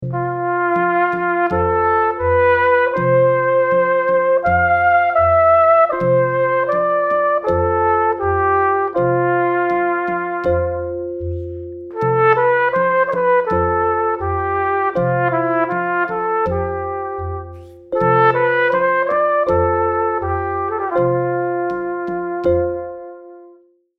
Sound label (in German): unsere melodie klingt anders lydisch